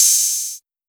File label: Earthquaker Open Hihat; trap; Hihats; Cymatics; Trap Starter Pack